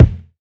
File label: nice kick drum single hit f key; Mono; kick drum samples